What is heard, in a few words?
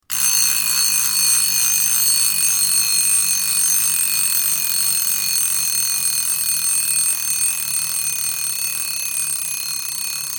loud alarm clock ringtone